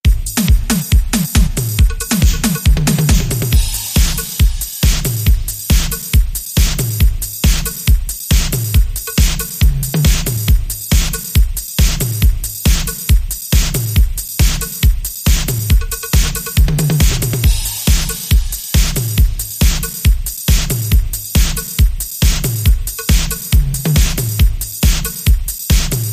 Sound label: Beat